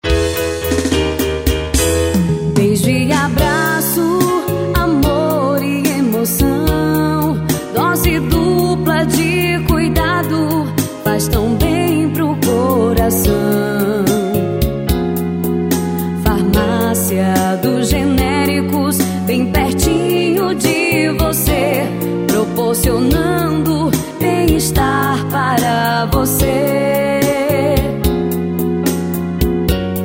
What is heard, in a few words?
JINGLE; FARMACIA DOS GENÉRICOS